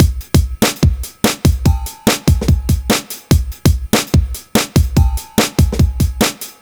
145 Jam Drums, 145bpm, Nu Dance Electro; Zero-G; Soundclash; Combi Loops; Inline Jam

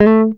GTR TRIK, Jazzistic; Univers Sons

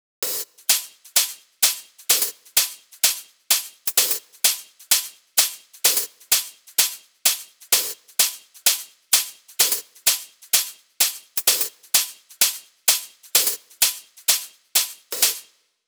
128 BPM HH; Vengeance - Essential Clubsounds Vol.5; Old College Kit Root G; Drums & Perc

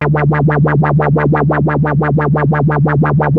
ARP 2600 C4, synthesizer; Hollywood Edge - Giorgio Moroder Rare Synthesizer Collection

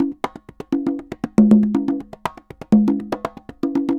CONGAS, SALSA; World Grooves; Best Service ProSamples vol.14; AKAI

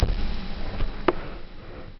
loop, techno atmos

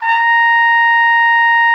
A#5, TRUMPET; Session Instruments; Best Service ProSamples vol.42

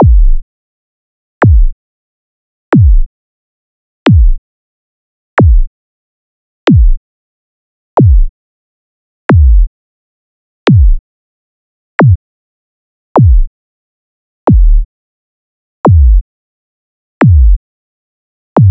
Traditional Kicks

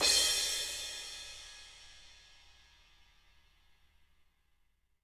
crash, crashes

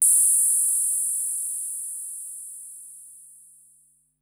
Noise High, Korg Wavestation-SR Drum Kit

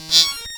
message, signals; AKAI; FX SIGNALS

